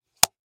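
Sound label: sounds of a high five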